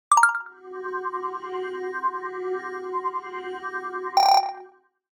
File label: scan fail